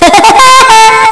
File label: Woodpeckers